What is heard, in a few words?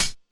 mellow hi hat one shot g key, Royality free hihat sample tuned to the G note